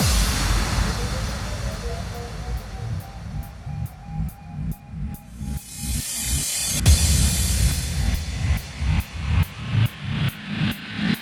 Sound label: 140BPM FX 8Bars; AlienStar; Vengeance Samples; Snares FX Pairs